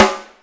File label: CM SNARE; AKAI S6000 CD-ROM - Ultimate